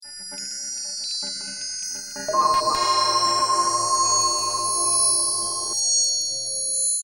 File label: Lullaby; Thanos Chrysakis